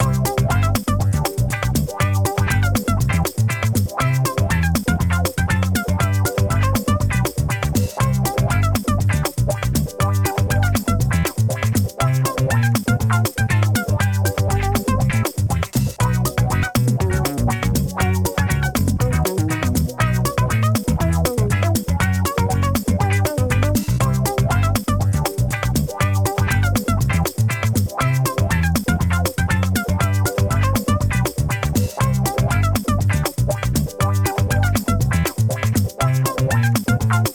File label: Write Music; Jackbox Games; The Jackbox Party Pack 1; DRAWFUL